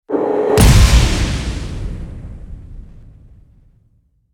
crystal hit swell

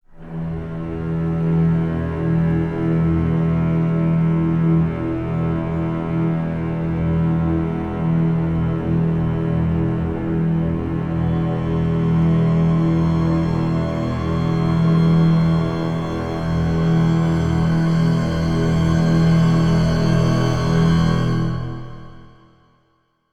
orchestra drone no perc

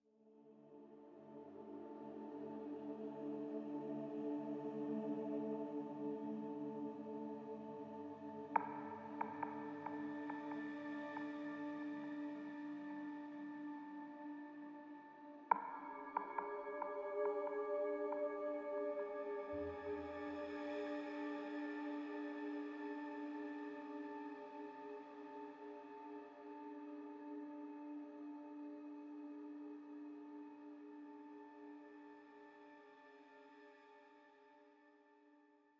tension one shots

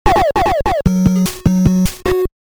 game over

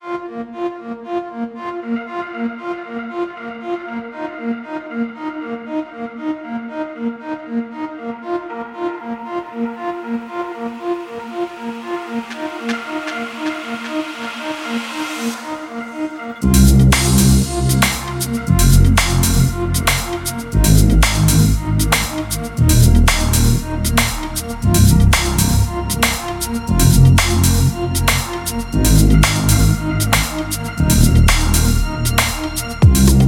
rainy piano mix

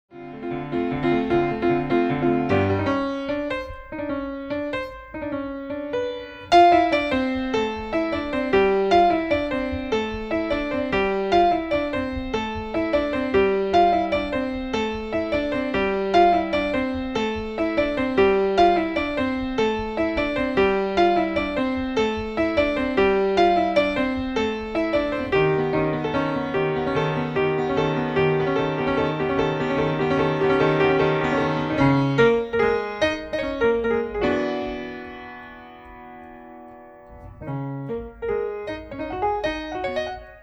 grand piano studio

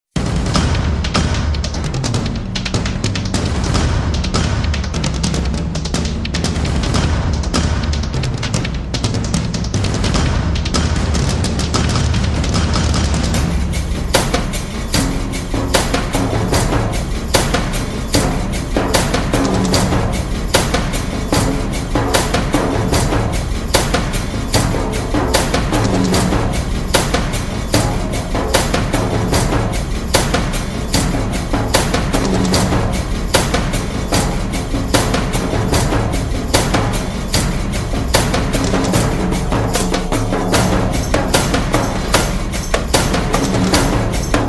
perc

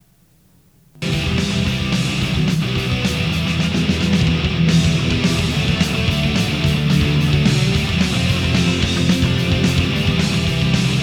guitar solo outtakes

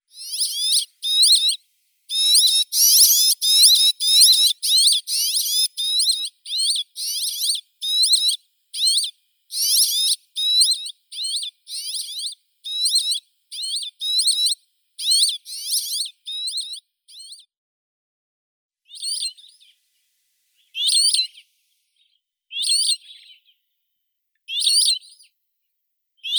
vorobei kamennii petronia petronia

